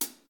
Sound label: Drums HH Closed; smite; raijin